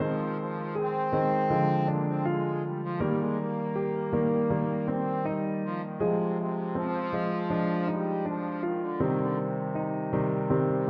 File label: trombone